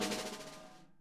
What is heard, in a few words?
SNARE, Interactive Drum Kits